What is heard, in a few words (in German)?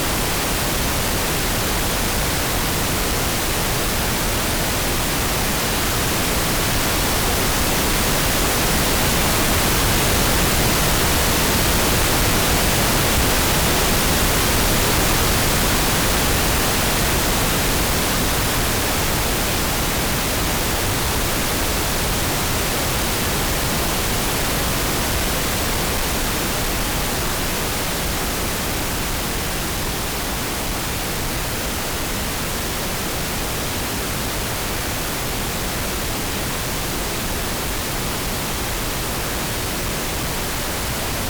Rosa Rauschen (wandernd)